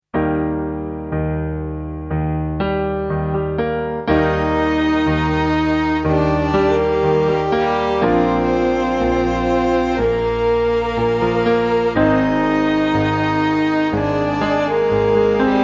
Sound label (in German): sweet quartett